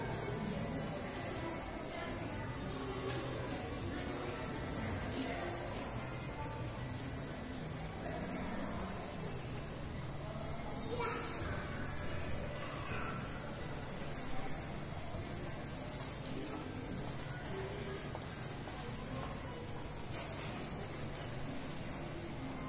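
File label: Ambience Mall General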